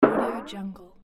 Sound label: percussive bounce